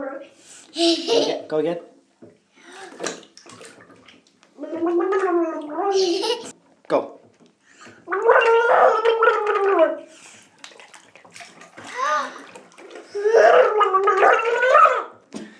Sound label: water bubbles